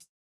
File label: no effect j city pop 1980 hihat